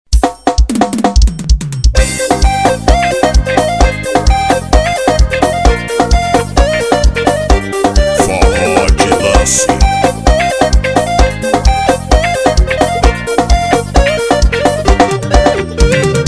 forro ao vivo

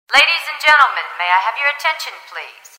techno som

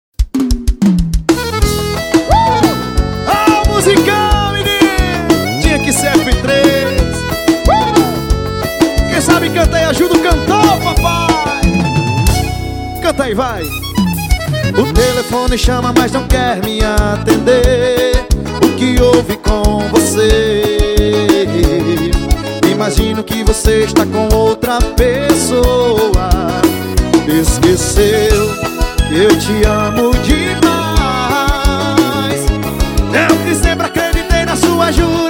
forro

